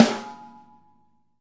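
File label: snare, Multisampled Real Kit; REAL LIVE KITS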